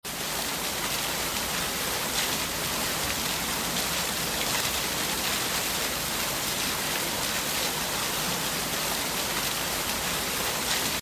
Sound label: water fountain